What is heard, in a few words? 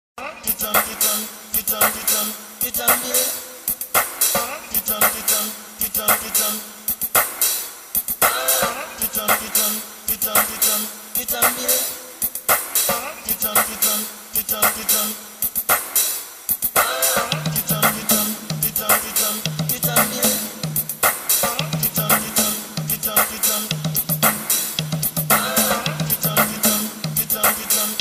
Titambire Instrumental